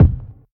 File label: warm kickdrum D# key, Royality free kick single shot tuned to the D# note. Loudest frequency: 149Hz